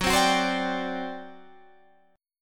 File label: G Major 7th Suspended 4th F sharp